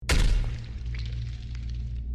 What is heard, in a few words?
ape death sound; lethal